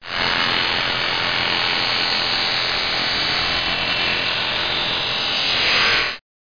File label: sander; Broderbund Print Shop Multimedia Organizer Deluxe Edition